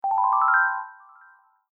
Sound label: pirorin